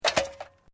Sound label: Metal Light Movement Minor; Materials